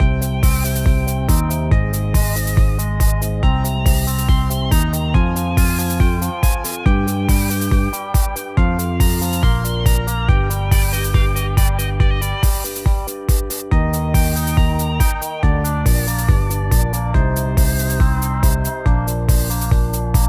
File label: Background Music; Udemy Card Battler